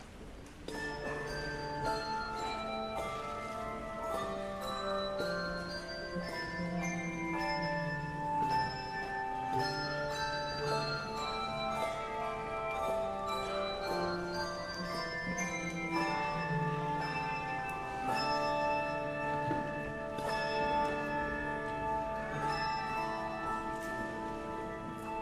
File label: Handbells; On This Day Earth Shall Ring